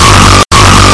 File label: battlecry; spy